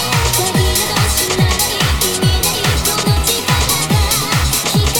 BASSLINE

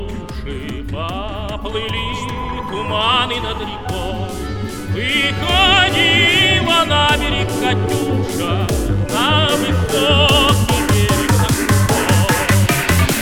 Hardbass

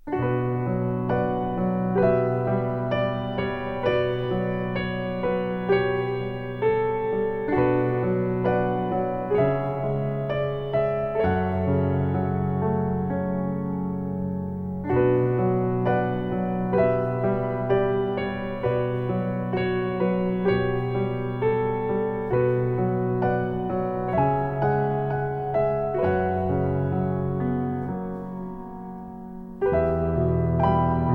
piano ver.

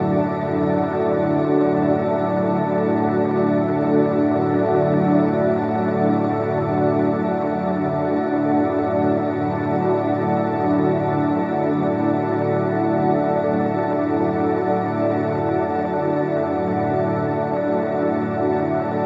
Ambient pad D; Rialasten 2022 Xmas Sample Pack; 2022 Xmas Packs

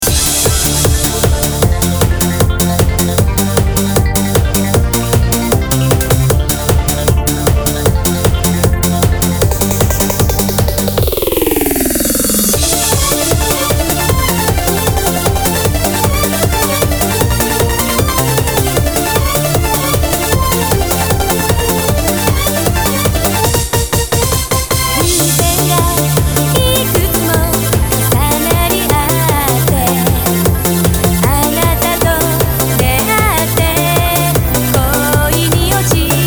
Eurobeat; Animation Eurobeat Series Parapara Max 3; To Heart

